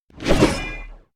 axe spin attack; unsighted

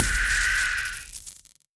Turret Death

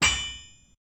Drinking Fountain; gamerip

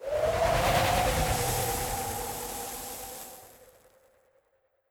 Sandstorm

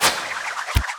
Needle Thow; Hornet

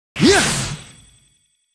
Summoner M Cast

